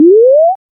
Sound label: clean modern game show so